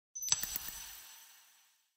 UI comform sound

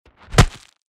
SMACK

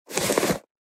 put into stash

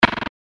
CANCEL

